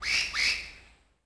bird Call; theed; Star Wars Obi-Wan; XBOX

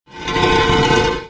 domino move, objects; movers objects

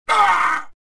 choke; Star Wars Jedi Knight II Jedi Outcast; XBOX; reelo

